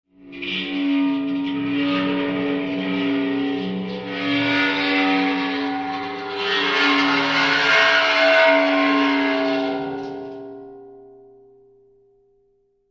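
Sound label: Dry Ice Eerie Mid Metal, dry ice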